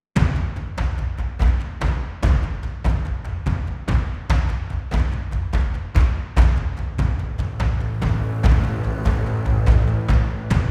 Snoring Forest - Combat

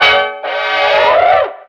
Beat

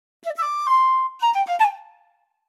Dialogue Box (Dizi); B Path of the Teal Lotus; Black Bird

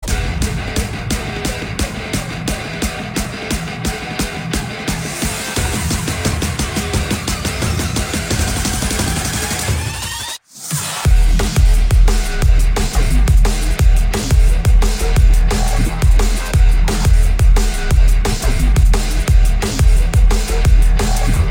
Medium Dino Soundtrack; Dino Squad; Android

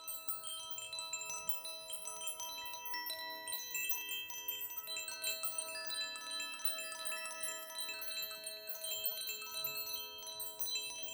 Portal Loop; Blow Fly; Xbox Series X/S

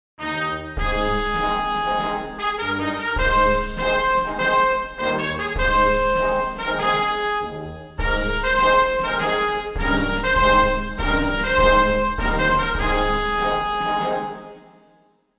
Victory march; Victorymarchverdi

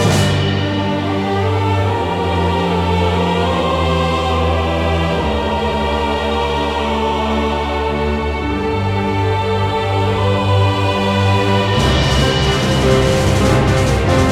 Boss Battle Loop

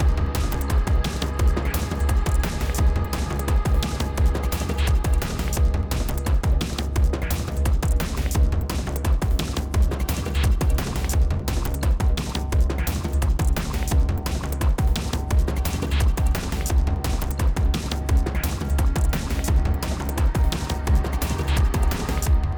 Chase 172 5BPM

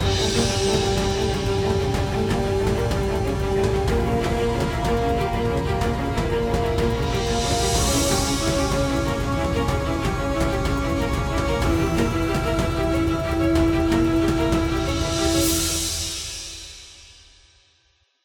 Kill Jungle Crash; Sarco; tintin reporter cigars of the pharaoh

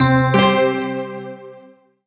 Solo E Piano Positive; rising islands windows gamerip 2016

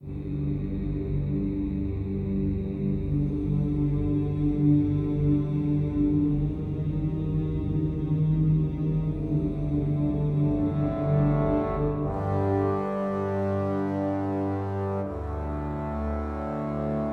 Action 120bpm Low No Perc; project zomboid

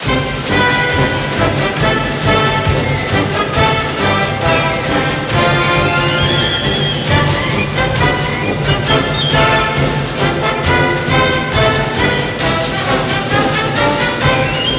Broadcast Fight Song

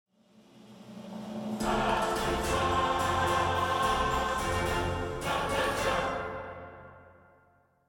Choir Wider Quad; fat princess adventures

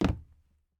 plastic land

